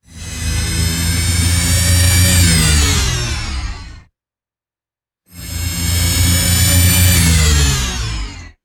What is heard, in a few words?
electric hoverboard passing by and decelerating